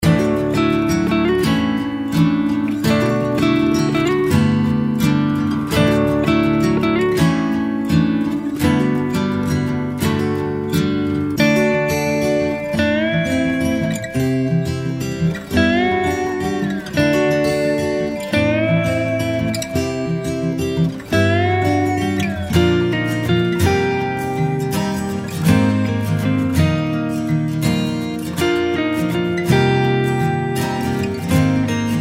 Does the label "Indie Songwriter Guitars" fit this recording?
Yes